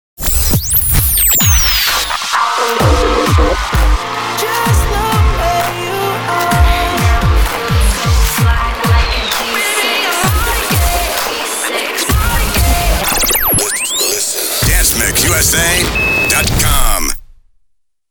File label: beatmix; dancemixusa